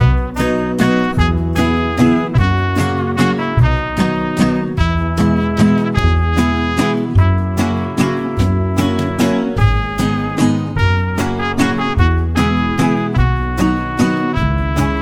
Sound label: Waltz Mariachi w Trumpet; Latin